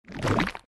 Ink Jump

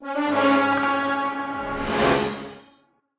box animation music; OK K.O. Lets Play Heroes; metalgears sc

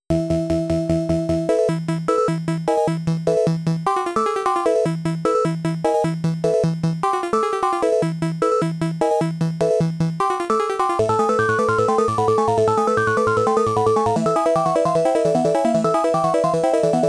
Ingame Music; Motos

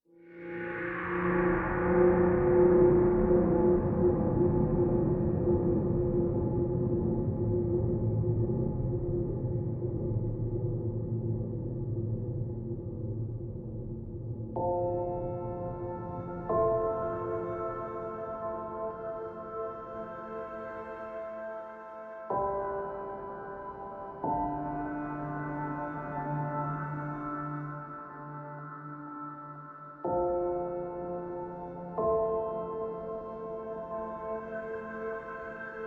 Exploration Night Drones; horizon zero dawn

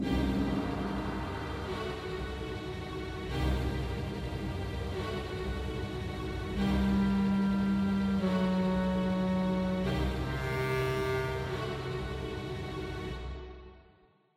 Danger Pad; Maharaha; tintin reporter cigars of the pharaoh

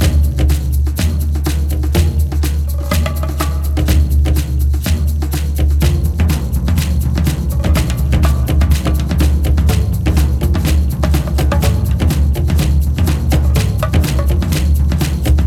TENSION LOOPABLE

